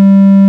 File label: g3 triangle